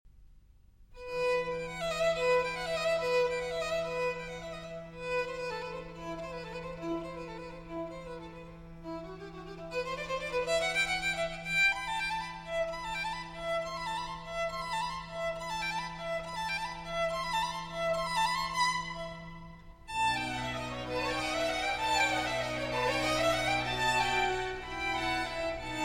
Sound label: Violin Concerto in F Minor; Op. 8, No. 4, RV 297 L'inverno